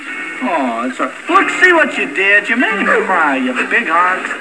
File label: cry; Spy